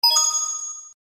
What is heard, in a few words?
coin; Super Mario 64